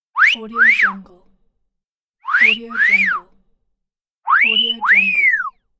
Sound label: wolf whistle